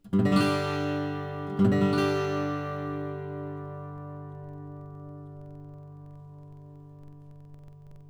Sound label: Auditori OM Mahogany Fleur de Lis, guitars